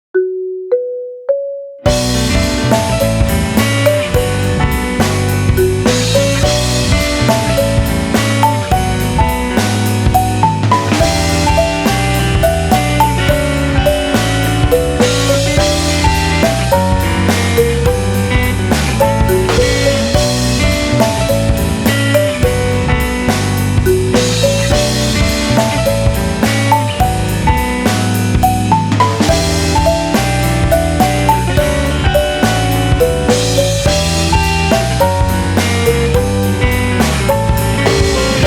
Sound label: Tea Leaves, instrumental